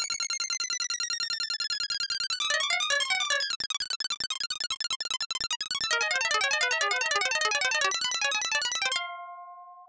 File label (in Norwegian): Harmstreng